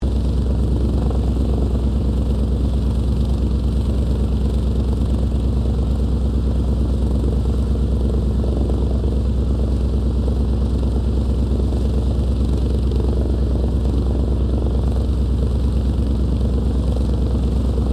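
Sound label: AIRCRAFT LOCKHEED L-1049 SUPER CONSTELLATION SHUTDOWN LEFT; 1955; Connie, Super Constellation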